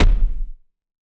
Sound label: heavy drum